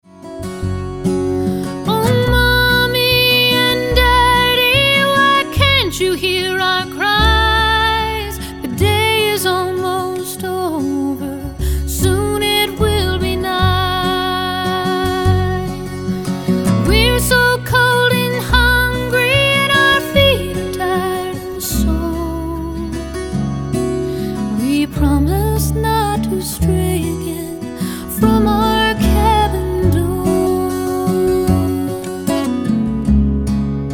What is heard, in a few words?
chorus